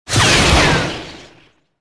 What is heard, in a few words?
green beam start; movers objects